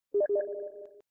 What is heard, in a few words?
steam; cave